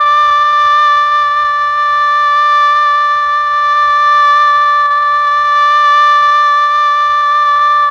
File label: MEL OBOE